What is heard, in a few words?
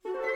Music Fight Brigands Theme Easy Bpm160 Start; asterix obelix slap them all 2